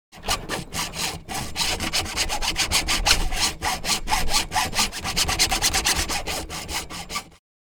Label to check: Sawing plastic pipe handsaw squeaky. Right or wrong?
Right